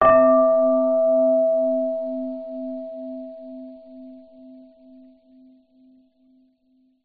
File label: bell medium large soft hit chime; zapsplat